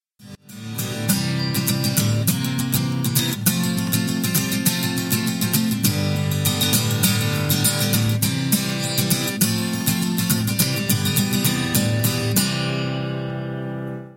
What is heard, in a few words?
Instrument